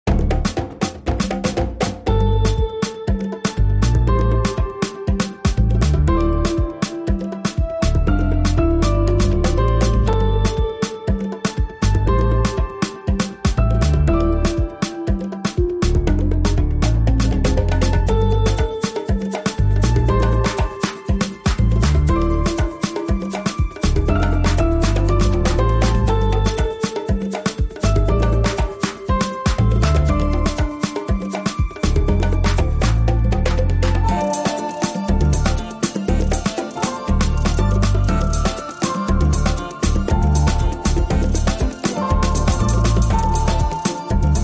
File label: Smooth Percussive Voice